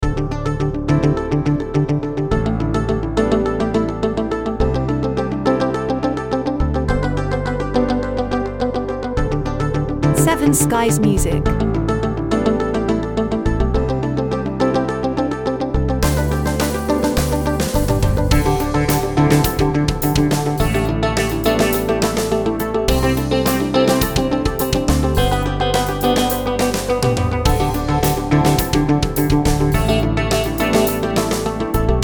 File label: Corporate Background Loop